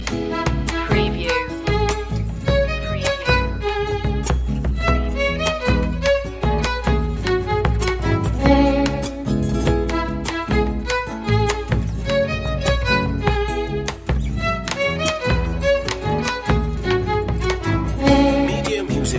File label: celebrating world music loop